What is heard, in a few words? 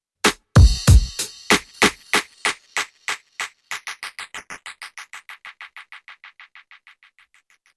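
LoFi Echo Break Delay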